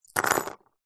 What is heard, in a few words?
leisure board game tokens metal handful set down on playing board; monopoly